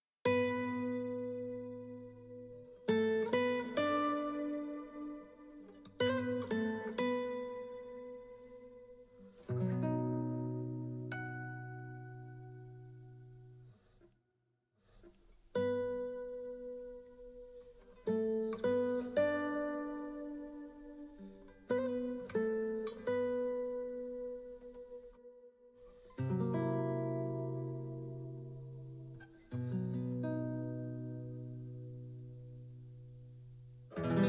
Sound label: Guitar